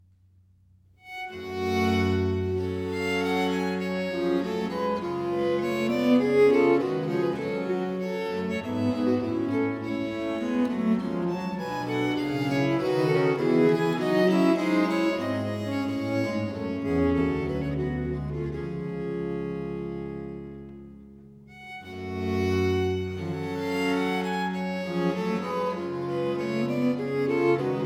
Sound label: suite no. 5 in four parts, in g minor, English music for viols